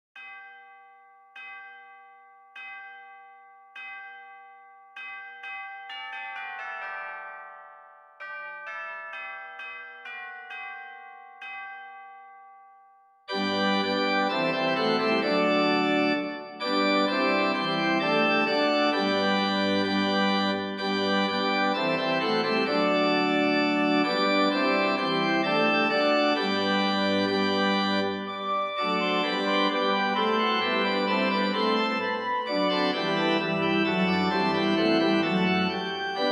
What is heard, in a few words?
Organ Bells